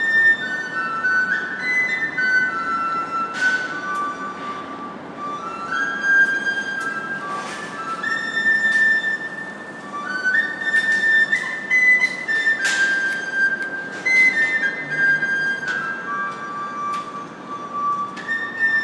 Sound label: tin whistle